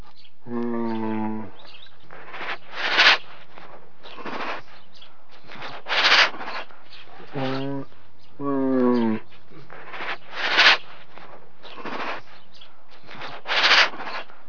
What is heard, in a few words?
Tiger greeting; animals